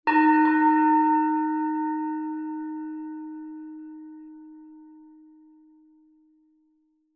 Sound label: bells medium bell soft strike long decay